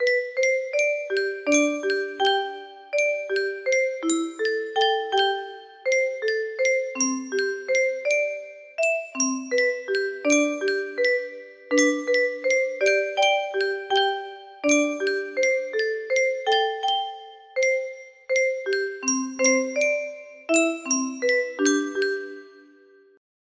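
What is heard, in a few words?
email, music box melody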